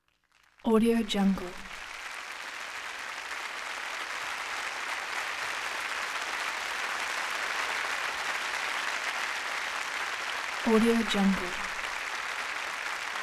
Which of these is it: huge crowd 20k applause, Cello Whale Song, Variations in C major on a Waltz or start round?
huge crowd 20k applause